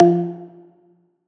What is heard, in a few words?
One-Shot F Knock, Hip Hop and Trap Melodic One-Shots; Ghosthack